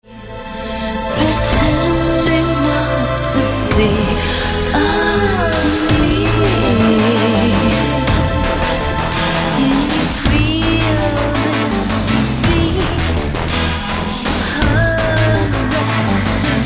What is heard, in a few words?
distort